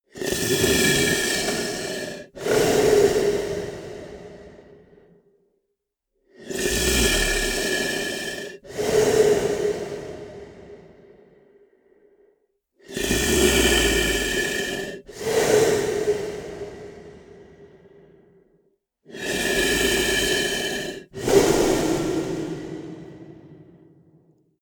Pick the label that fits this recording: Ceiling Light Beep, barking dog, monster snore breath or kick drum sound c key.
monster snore breath